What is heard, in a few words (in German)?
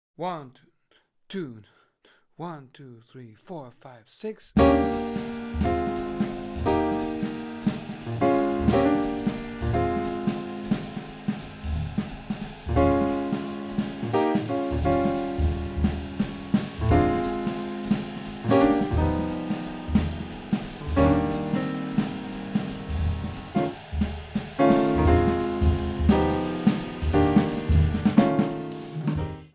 blues in b dur im 6 4 takt play along